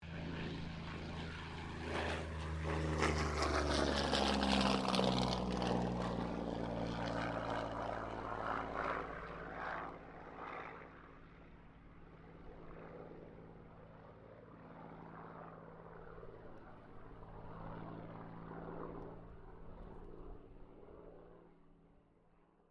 AIRCRAFT YAKOLEV YAK-9 TAKEOFF, Russian Yak-9 fighter plane; Airplane Sound Effects; Airplanes (Sound Effects:Vehicles)